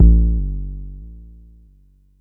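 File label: Mod bass drum, Jomox Airbase99, Drum Machines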